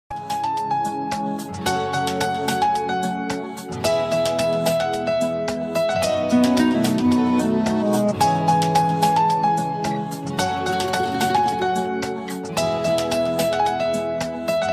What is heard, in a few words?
hindi music ringtone